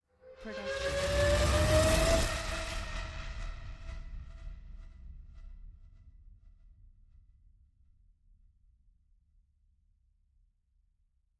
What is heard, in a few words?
Buzz Riser